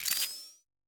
Templo Olho Click; super mombo quest

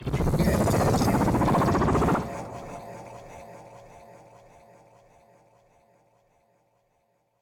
Concentrando

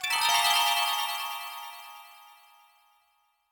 Morte Cristais

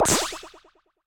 Pulo Duplo Thrust; Super Mombo Quest

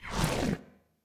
Transformacao Diminui; super mombo quest

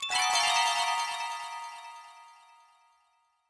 Morte Cristais; Super Mombo Quest

